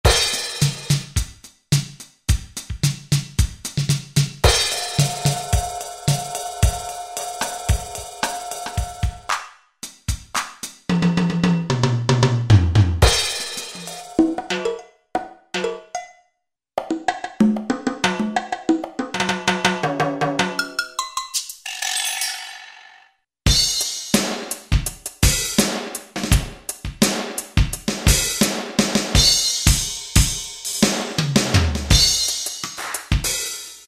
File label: DIGITAL; Best Service Gigapack